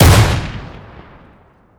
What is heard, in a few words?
burstgun engi shoot; tf2classic